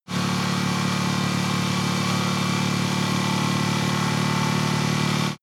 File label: motorcycle engine medium low constant rpm ascending; motorraeder; verkehr transport